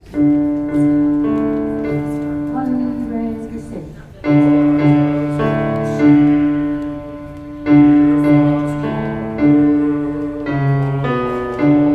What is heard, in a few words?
bass; Gather Hymnal